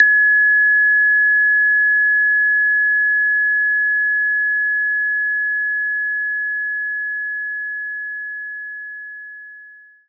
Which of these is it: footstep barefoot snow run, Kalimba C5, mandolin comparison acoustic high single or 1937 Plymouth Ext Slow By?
Kalimba C5